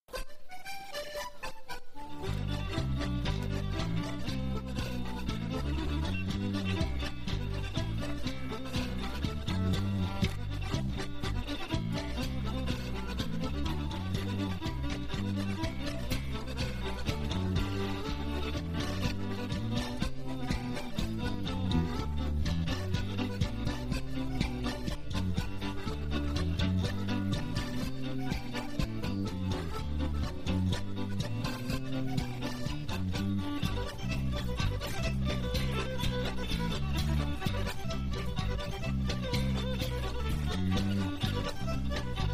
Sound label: Instrumental English Polka; Pepper In The Brandy